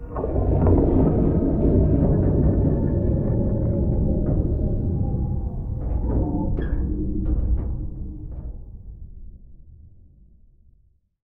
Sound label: Carrier Idle